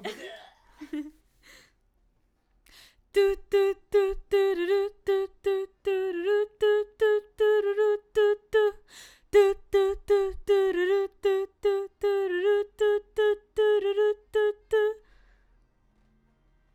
BG Vox

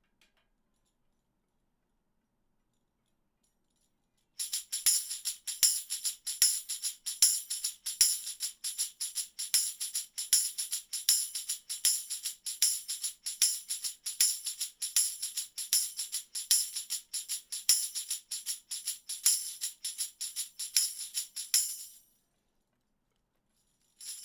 Tambourine

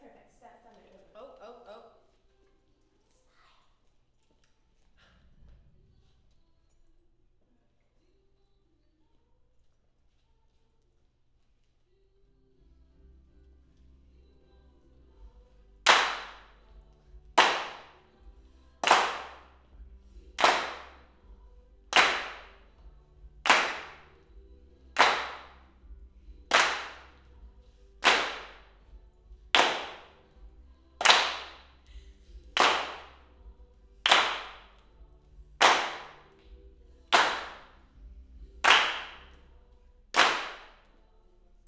Claps Mid

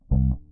Bass

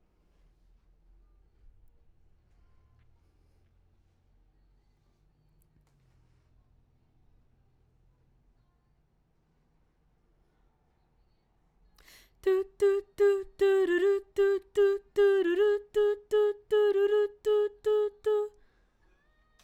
BG Vox

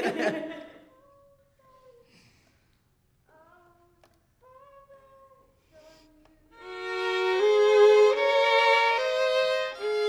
violin

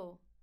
BG Vox

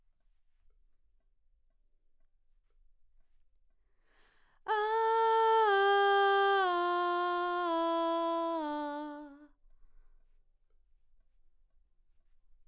BG Vox

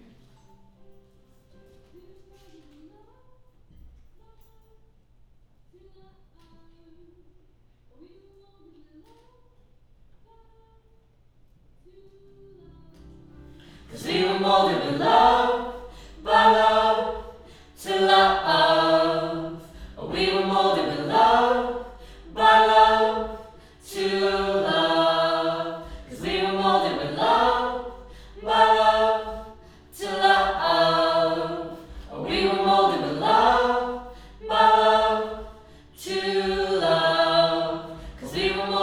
Choir L

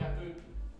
Cello

